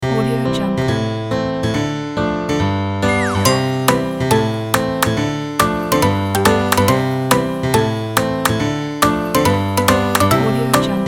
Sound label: funny kids